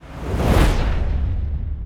damage hits explosions; World Of Warships

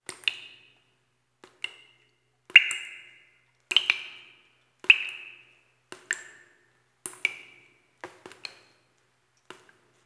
water drip bath

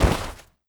snow; Rust

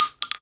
shell bounce, weapons, shells; ModernWarfare

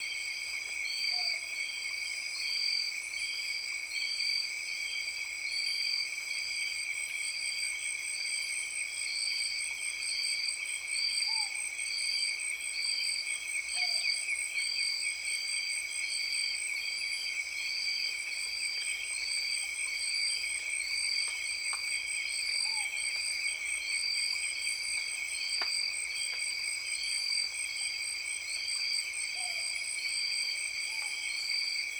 amb tropics night

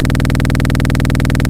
loop m61 bass, guns tanks

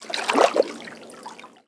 Amb Weather; Red Orchestra 41-45

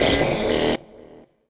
feedback

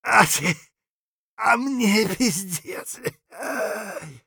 hurt near death; Escape from Tarkov; scav6

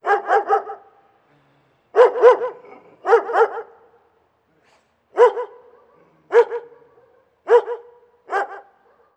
dogs distant; Call of Duty Modern Warfare

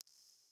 water drip light; Rust

